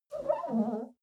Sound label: clean window paper towel slow whimper